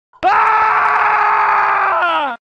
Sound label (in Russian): Дикий Крик Бешеного Мужчины; Звук на СМС